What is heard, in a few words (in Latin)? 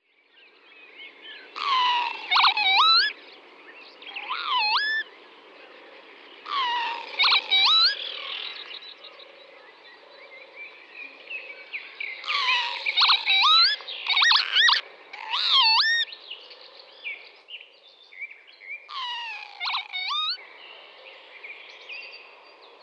Vanellus vanellus